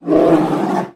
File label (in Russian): krik bolshogo starogo medvedya